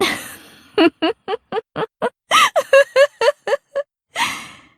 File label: laugh; featherine